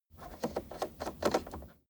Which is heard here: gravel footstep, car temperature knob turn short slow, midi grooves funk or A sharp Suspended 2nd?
car temperature knob turn short slow